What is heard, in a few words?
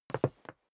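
step dress boots wood parquet scuff, footsteps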